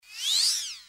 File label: broom zoom hit